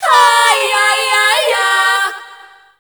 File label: FEMALE CHOIR, Dance Vocals